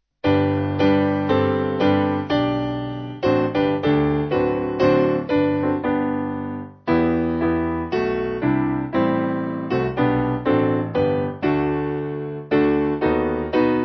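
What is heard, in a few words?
Piano, church music backing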